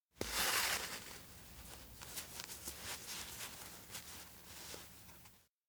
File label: sew shirt; anemoi